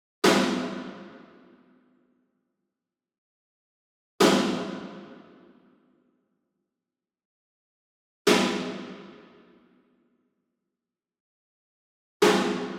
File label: SINGLE SNARE Ludwig Black Beauty Hit Rim Far; boom library; Cinematic Strikes Construction Kit